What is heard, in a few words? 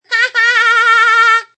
Crazy Kid Laugh, A crazy sounding kid's laugh.; Evil Laugh Sound Effects; Sound Effects:Horror